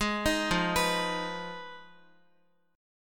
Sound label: F Minor 7th Flat 5th, Guitar; Fm7b5; arpeggiated